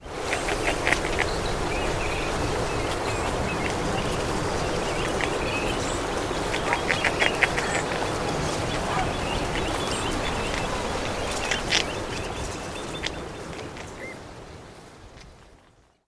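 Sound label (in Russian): звуки выхухоля в дикой природе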